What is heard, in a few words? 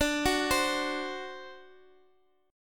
D 7th D down Guitar Open D